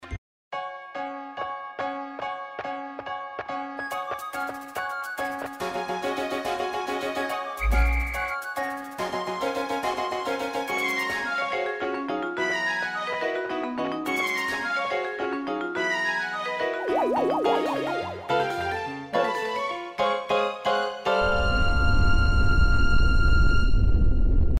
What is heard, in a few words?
end of day; gamesoundopedia